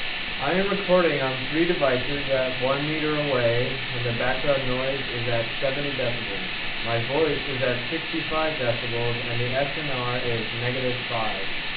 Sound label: white noise; voicerecorder